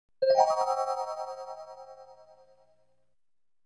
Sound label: twinkling idea accent with up scale